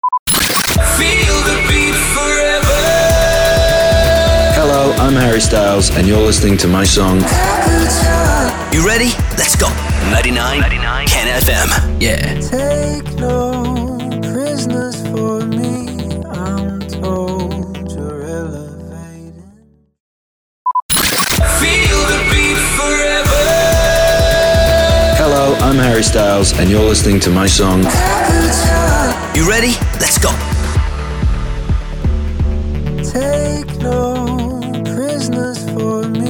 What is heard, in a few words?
SWEEPER POWER INTRO 128BPM